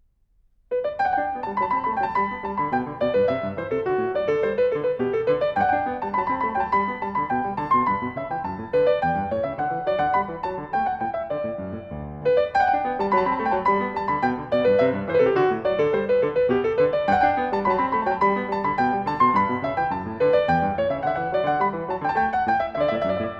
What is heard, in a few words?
Bourrée